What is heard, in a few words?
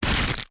zling hit; sinfar haks